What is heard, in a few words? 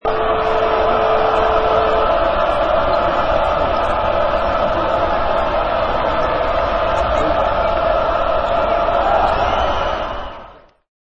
Arena Foul Shot Noise